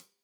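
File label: hat closed, hi-hat cymbals stereo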